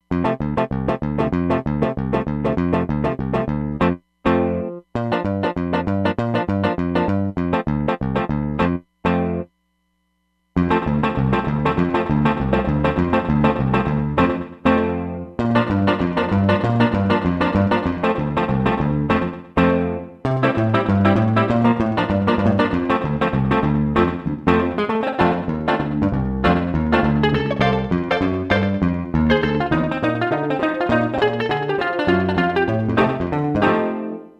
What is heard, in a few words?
Chorus & Stereo Slaps, Roland DEP-5; Factory Patches